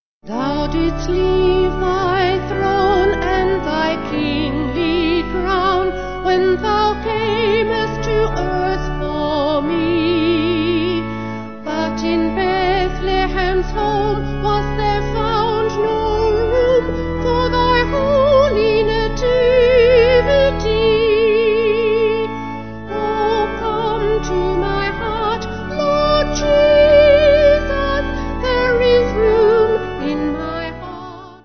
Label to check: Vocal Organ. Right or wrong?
Right